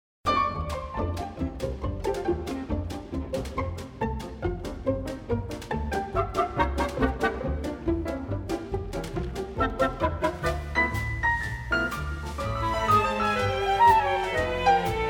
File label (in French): orchestre